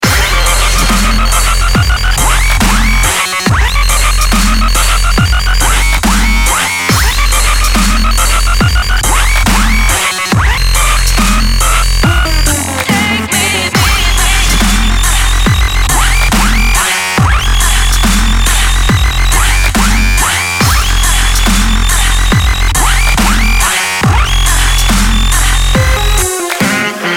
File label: reggae dubstep